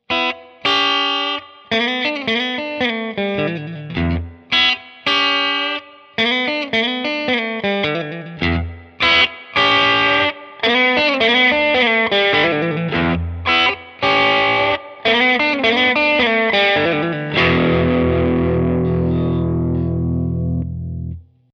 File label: Off On